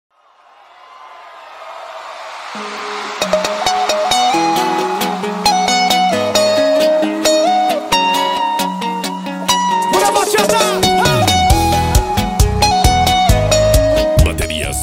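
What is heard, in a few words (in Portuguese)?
especial sertanejo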